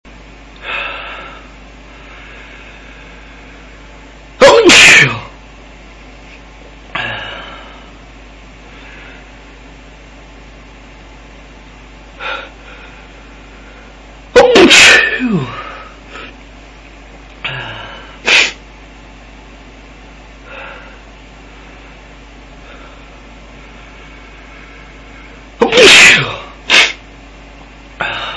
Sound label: just sneezes, male